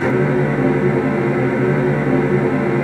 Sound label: STR WHL TRLL, Virtuoso Strings; ILIO; Kirk Hunter Virtuoso Strings VOL-3